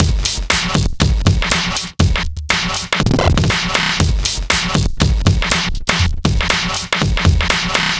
Beat, Modular Electronica; Sonic Foundry (Sony Creative Software); TSS Autopilot

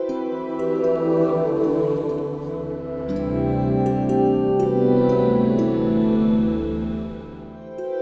Pretty Poink, Pastoral; Sonic Foundry (Sony Creative Software) - Friendly Fire

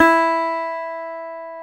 GTR, Dobros, National

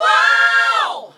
vocal; Houseworx

